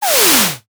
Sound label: whoosh deep hit